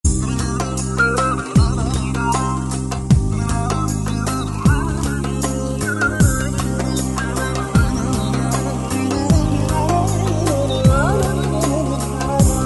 Nokia Tune N95

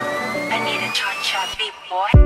Cha cha beat

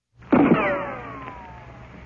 Gunshot

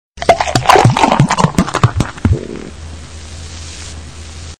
Pouring Beer

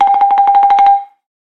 Modern Ringtone

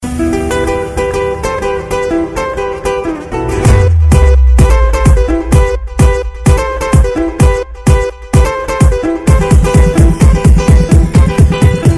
Mariachi Mix

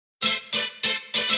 Ding Da Da Tada

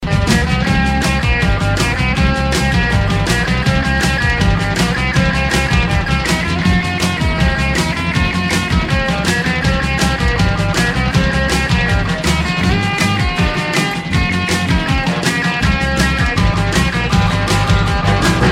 Hawaiian Song

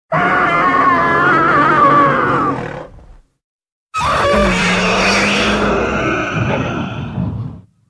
Roar

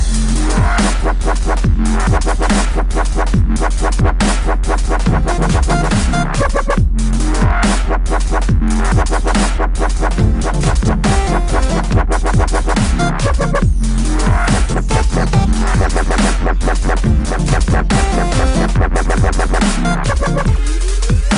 Dubstep Mashup

